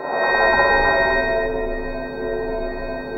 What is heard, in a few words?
ATMOPAD; Best Service; XX Large; Pads